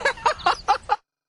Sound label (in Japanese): へっはっは（笑）; ドナルド